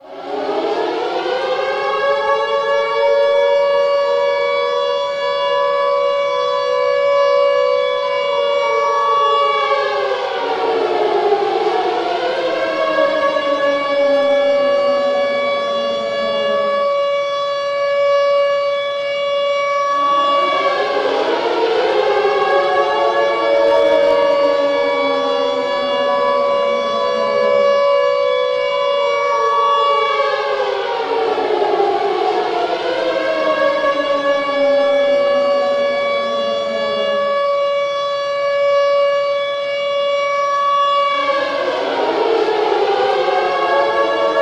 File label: siren long; stormfront